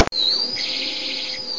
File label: police siren